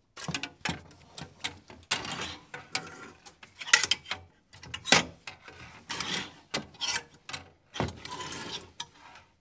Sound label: Cabinet Hangers